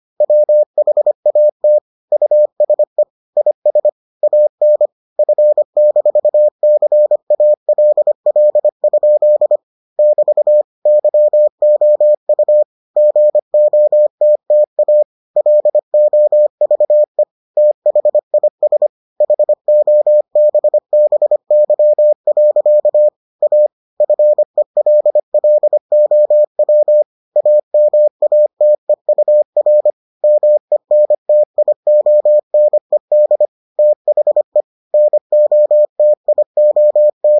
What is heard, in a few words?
morse